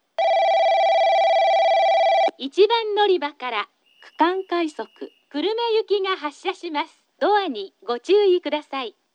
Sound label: kurosaki regional rapid kurume; 1番のりば発車放送（区間快速 久留米行き）